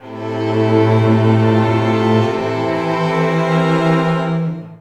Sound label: A 150bpm, Strings; Zero-G; Total Drum Bass